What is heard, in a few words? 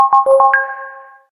Reminder Tone